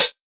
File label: sharp hi-hat e key